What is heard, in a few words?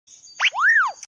chocobo; minecraft